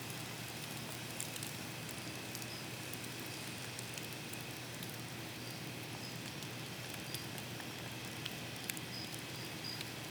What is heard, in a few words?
Live Recording - Cabin Fever; Cymatics; Orchid Sample Pack